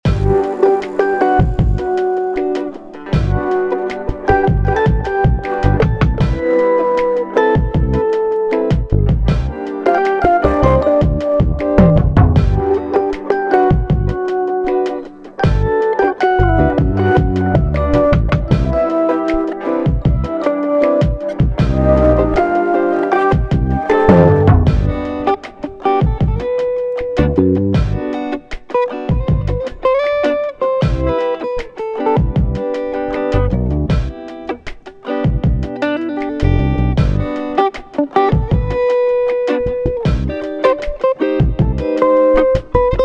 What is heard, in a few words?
Lofi neo soul; Milk tea on Saturday